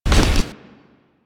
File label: Generic Vault; Characters; Warframe